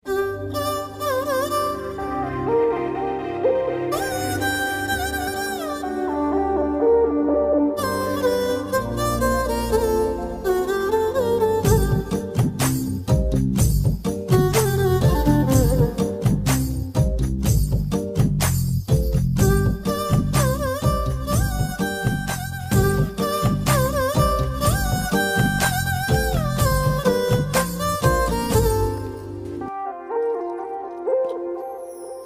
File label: love bgm, telugu movie bgm; iddarammayilatho; allu arjun